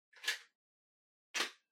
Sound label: Portable MD Far Insert Close